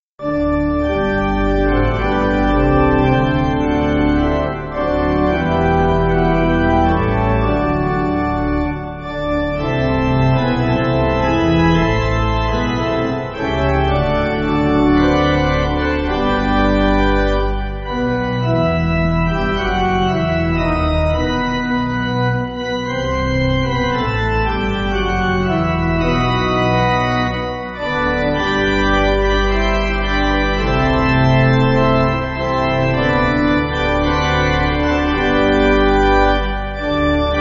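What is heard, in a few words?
Pipe Organ, Music Backing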